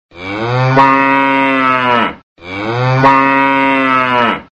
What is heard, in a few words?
Cow Moo Sounds Car Horn